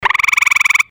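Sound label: ringing chirping of tree frog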